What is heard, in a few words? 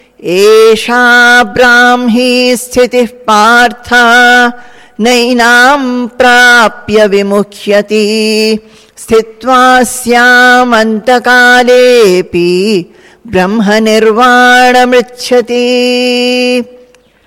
Chanting